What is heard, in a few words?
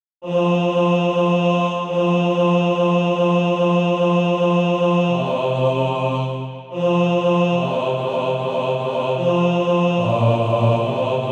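bass; Faith and Works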